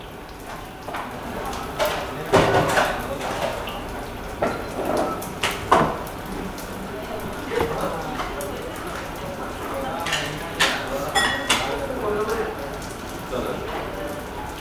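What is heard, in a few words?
Korean Restaurant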